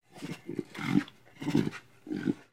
Pets Lion Sleep; creatures; Lego

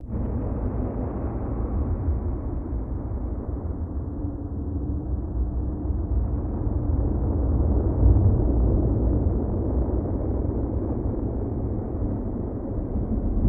Lightning Garden, ambience; ninjago

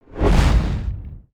Gorilla Slam Ground; creatures organic

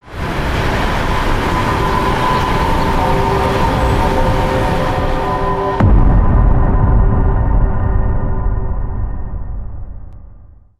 Pet Rock Binoc, ambience

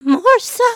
beg

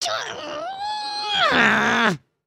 Angry, emotes

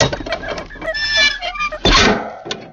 cannon push

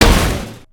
impact; emotes

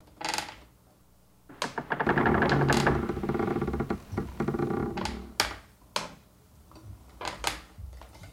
Jungle Bridge Large; gnarled forest

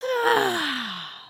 sleep start, emotes

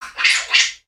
Pets Skunk Speak; creatures; Lego

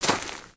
Footstep; Lego; Strombie; creatures organic

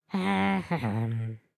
Idle Bored, creatures organic; Lego; Strombie

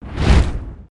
Dragon Wing Flap

